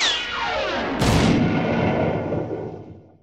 whistle down crash

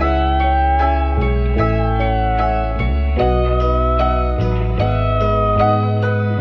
guitar woeful, Sad; Loops

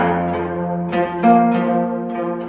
guitar, Sad

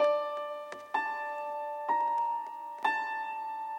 piano, Sad

